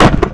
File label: cone hit; AOS RF1 Kart Demo